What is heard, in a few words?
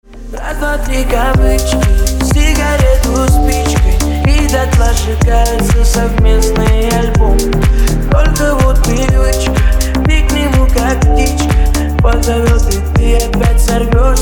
rington remix